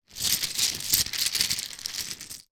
game plastic houses and hotels in cloth pouch movement; monopoly